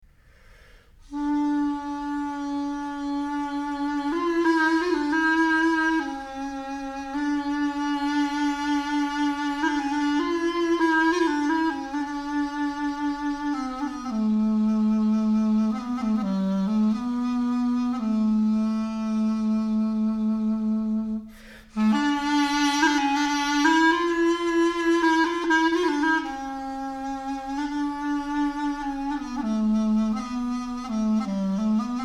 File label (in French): chalumeau Improviation